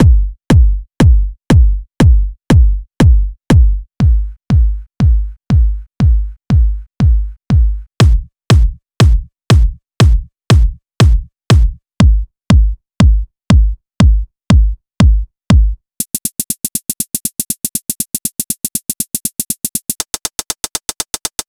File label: house music 1990s